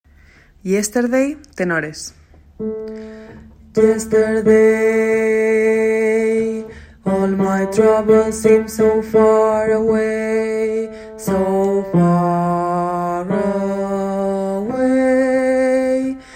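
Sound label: tenor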